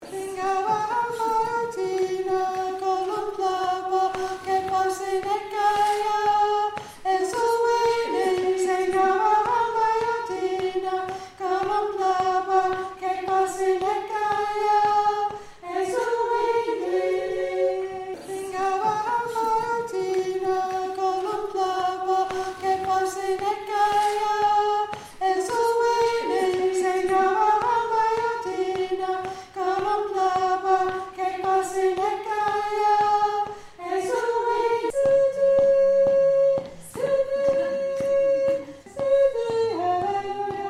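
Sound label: Soprano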